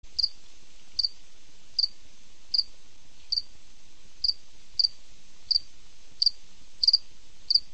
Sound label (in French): Cricket de nuit